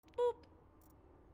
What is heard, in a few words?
Boop just boop